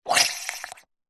foley liquid water air bubbles surface shallow short